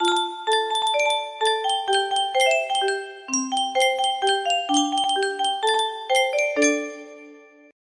music box melody, Yunsheng Music Box

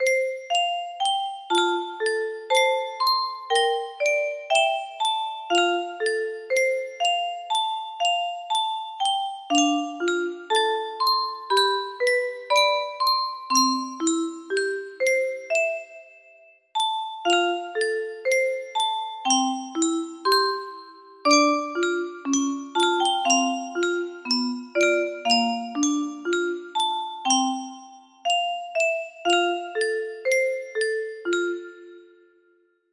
melodies, music box melody

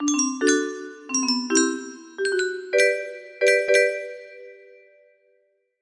boop boop, music box melody; ozzie

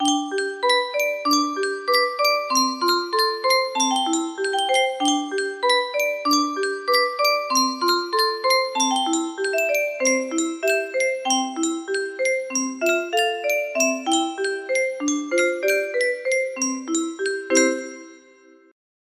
music box, music box melody

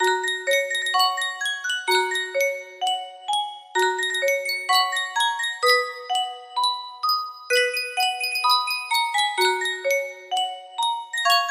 Sankyo Music Box, music box melody

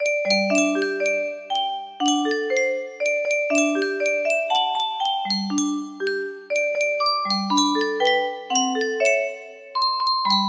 melody

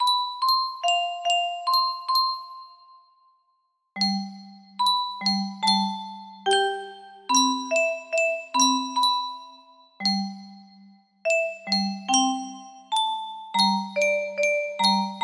music box melody

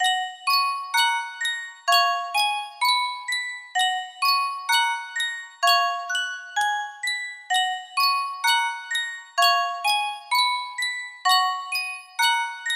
music box

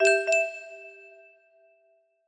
melody, music box

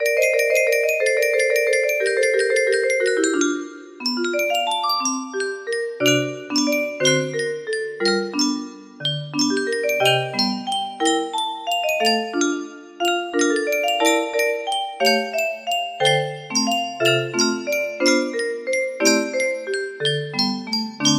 melody, music box

